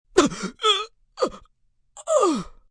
Pain Stomach, Pain, male, stomach pains, agony, moan; human voice